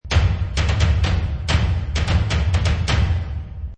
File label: Toms3 Loop 130bpm, Toms Loop at 130bpm; Drum Loops